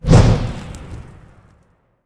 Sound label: swing; smite; guanyu